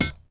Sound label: pipe hit dirt; impacts explos